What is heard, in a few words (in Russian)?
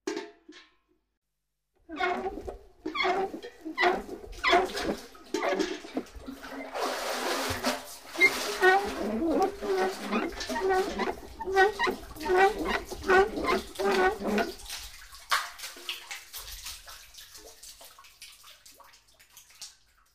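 zvuk nabiranija vedra v kolodce, drawing a bucket from a well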